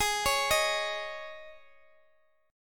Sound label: G sharp Suspended 4th, Guitar, DADGAD; x,x,x,13,16,13